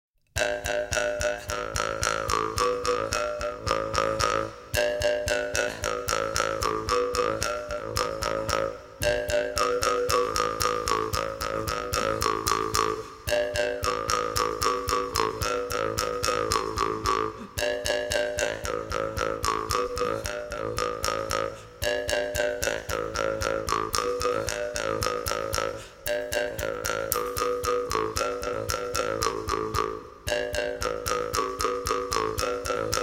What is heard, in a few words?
Folk Ensemble, Jygach Ooz Komuz